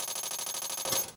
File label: geo deplete count down